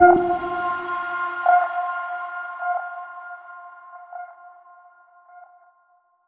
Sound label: Quirky Chronicles Blip Ping